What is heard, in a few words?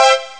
c major; Amiga Soundtracker Sample Packs